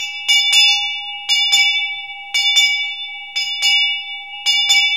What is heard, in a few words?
BELL RINGS; Zero-G Ambient 1